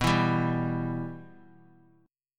B Suspended 4th Sharp 5th, chord; Bsus4#5 strummed; JGuitar

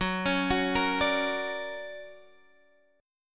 D Augmented Major 7th F sharp, guitar

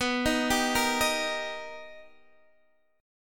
B Augmented Major 7th B Guitar Half Step Down

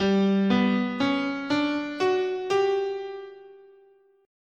G Chinese, scale

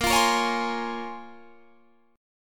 Bb Minor 9th Bb, Guitar, Standard; Bbm9; strummed; 18,20,20,18,21,20; JGuitar

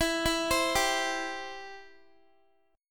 E Minor Sharp 5th, Guitar, 4F,4A,5C,5G,5C,6E; arpeggiated

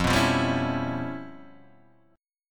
Db 7th Sharp 9th Flat 5th F, chord shape

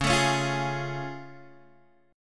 A Augmented Major 7th Db

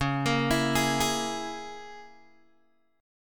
C sharp Minor 6th C sharp, Guitar Open G

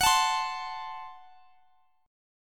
F sharp Diminished; F#dim strummed; x,x,x,23,22,20

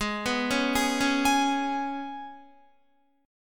G sharp Minor Double Flat 5th G sharp, Guitar; 15,14,13,13,13,16; G#mbb5 arpeggiated

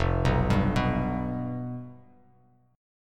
F Suspended 2nd Flat 5th, Bass Standard; arpeggiated; 1,2,5,4; JGuitar

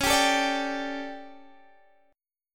D Major 7th Suspended 4th, chord

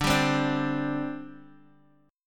D 7th Suspended 4th, down, Guitar, Half Step Down

